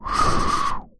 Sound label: magic wind